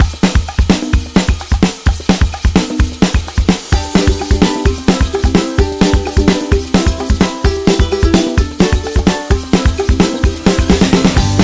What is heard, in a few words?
Happy Indie Folk, happy music; happy music vol 2